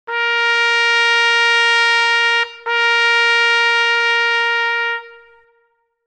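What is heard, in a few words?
signals, bugle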